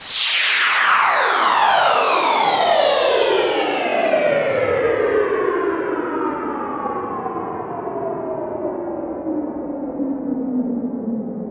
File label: Sweeps Down